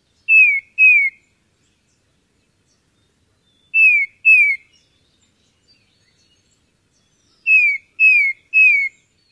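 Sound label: songs, Tufted Titmouse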